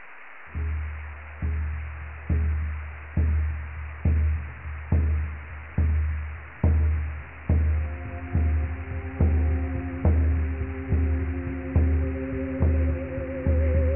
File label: ind chant